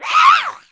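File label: ghost attack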